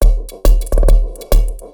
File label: percussion loops, Techno; PS-45 WAV Techno ID